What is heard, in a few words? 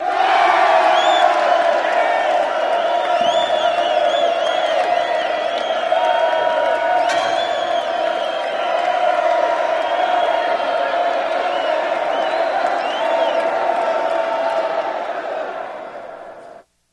Crowd Yell Cheering, big arena